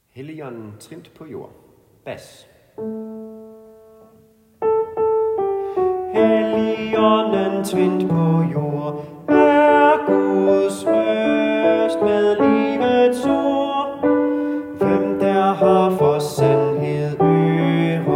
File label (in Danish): Helligånden trindt på jord; laudate kirkens folkekor